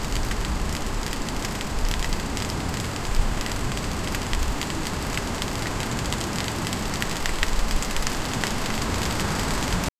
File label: loud heavy rain hitting pavement